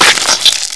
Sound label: rat death